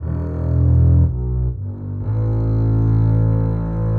Bass Loop (120BPM); cinematic drama; Loops; musicradar

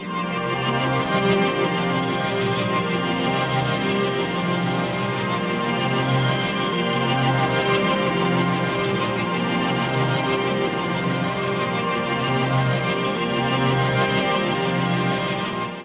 AMBIENT PAD; AMG - Global Trance Mission VOL-2

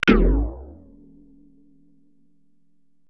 g6, Procussion Mix, Retro Sampler; Best Service ProSamples vol.55; EMU E-III

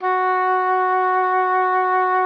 OBOE1, F#4; Xsample VOL-6

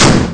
low s drum; ST-XX Amiga Soundtracker Sample Packs

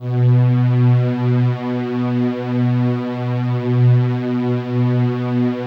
LUXURY B3, LUXURY PAD; Drums In Your FACE!

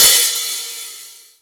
OPEN HH; USB Soundscan vol.51; AKAI

